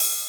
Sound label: ride